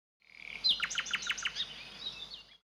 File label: Lake Bird, ambience